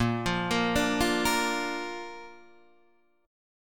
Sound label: Bb Major, Guitar, Open D